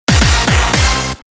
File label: jingle